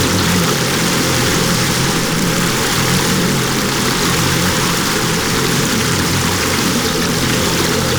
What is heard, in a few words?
Circular Waterfall Loop; Warframe; Duviri; Ambience